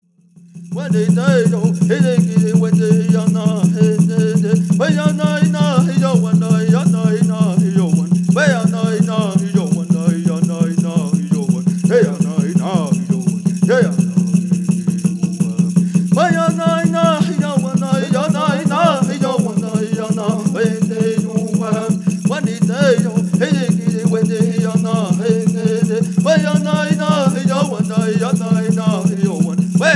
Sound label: Ceremonial Song